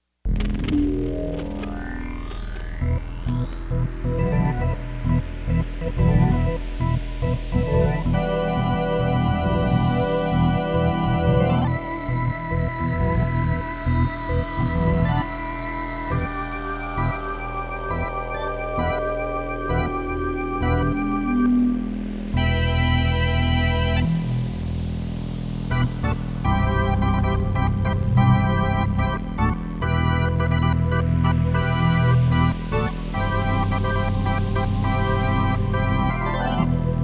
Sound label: electric